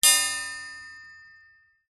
impacts metal pole or thin bar hit resonate chime

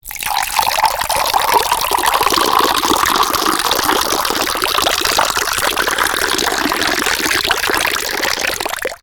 food drink pour milk into jug; sound effects one

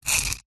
foley brick knife tip score scrape scratch line

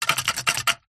office large sticky tape holder shake

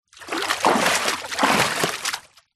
sport swimmer movement in water

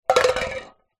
food tin can fall over on surface; zapsplat

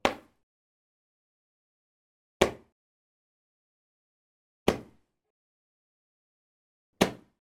firework roman candle eject 4 fireworks; sound effects one

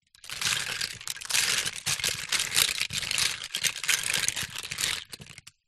toy cars metal many carpet movement together

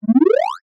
cartoon ascend short

